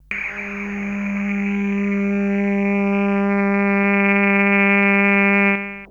Synth hits and riffs

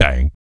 PERC, Vocal, Jazz FX, BASS PERC

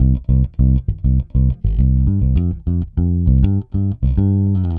Pop, Bass

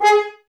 G#, Brass, F.Horns, Woodwind Brass Ensemble

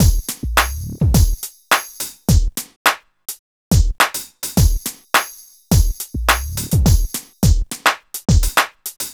Beat

